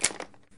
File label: Lock Picker Finish; Lethal Company